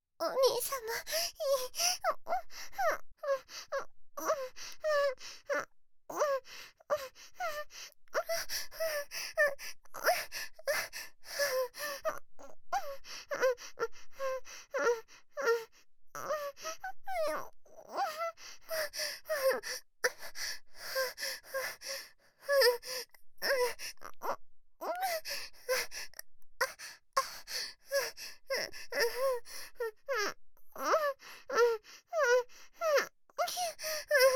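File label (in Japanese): 喘ぎ声リピート激しめVer(左右反転版)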